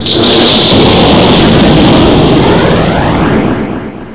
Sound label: Multi Battle; Crystal Caliburn DEMO